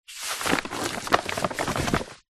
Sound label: paper sheet a3 turn over; foley